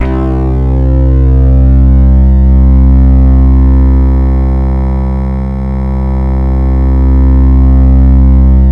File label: EDGELEAD; MORPHEUS